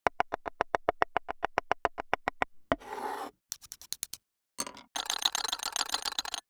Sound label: Cooking Animation